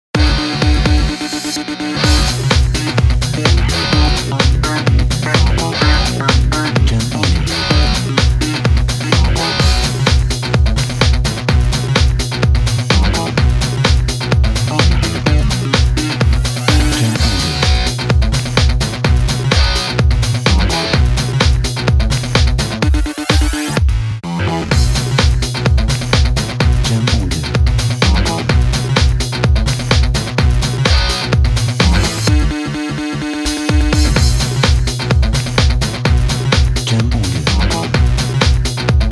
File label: Rock Action Sport